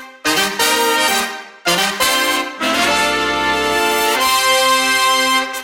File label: big win brass; vegas night life